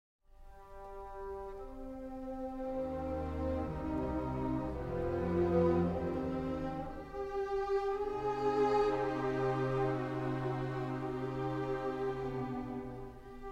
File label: Piano Concerto In E Flat Andante; Mozart; Piano Concerto 22; K 482